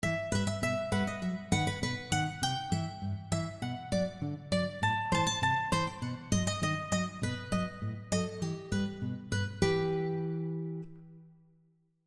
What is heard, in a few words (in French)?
Jingle guitare; Guitare access